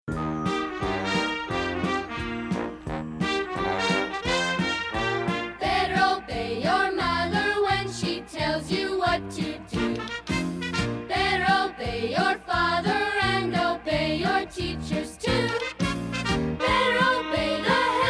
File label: Instrumental